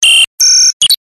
science fiction robot nano bot cute digital chirp beep; zapsplat